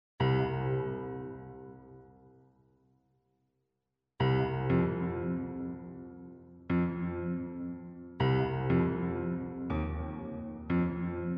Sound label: pianola; Katarakt records